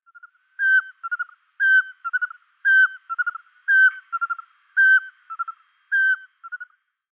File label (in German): Sperlingskauz Glaucidium passerinum, Vogelstimmen